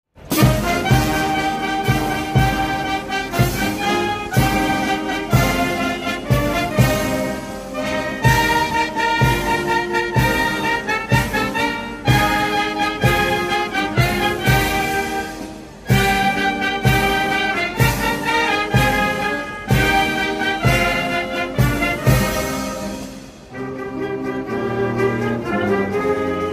National Anthem, Police Band; Micronation; Republic of Indie; Jana Gana Mana; Mumbai Police Band

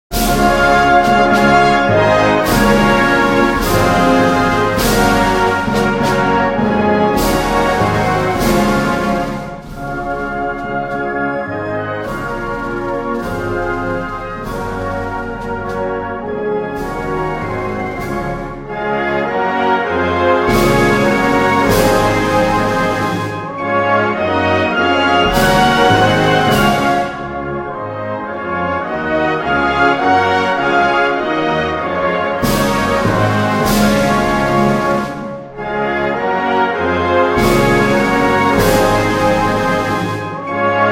Anthem; Anthem of Krzakacja

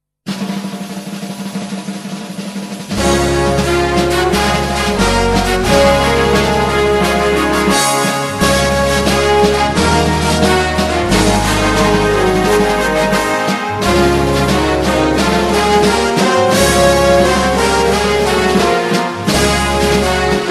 Anthem of Pakistan (instrumental)

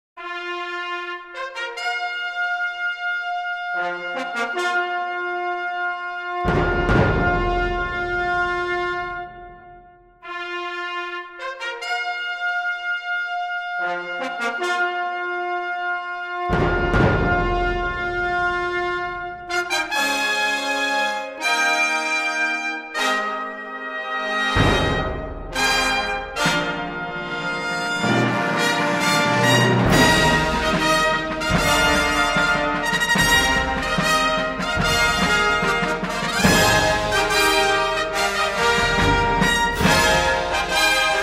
National Anthem; Atieran

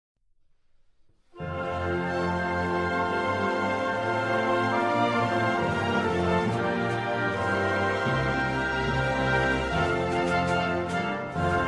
anthem; Olislelian

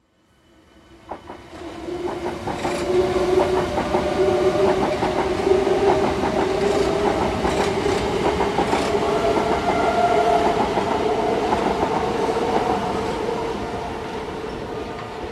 Trains

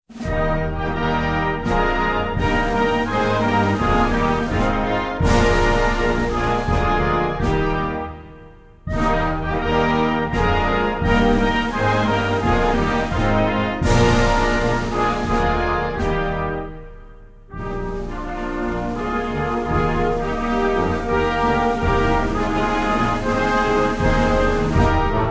Anthem instrumental; Jusin

